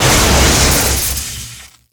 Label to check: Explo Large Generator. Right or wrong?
Right